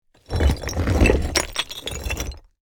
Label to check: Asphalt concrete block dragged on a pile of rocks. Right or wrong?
Right